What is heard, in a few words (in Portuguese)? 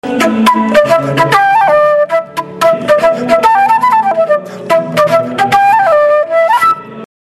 Flauta Transversal - CURTA - Vinheta Cantada